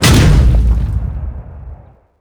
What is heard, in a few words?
hit normal